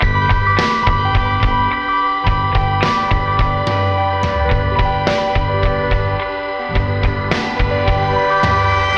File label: keys, Phonky loops